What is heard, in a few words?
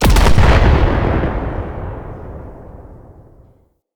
Dropship Explosion; Venus